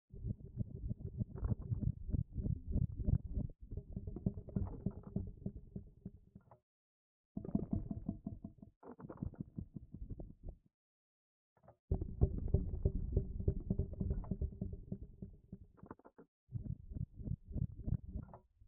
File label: Purple Rumble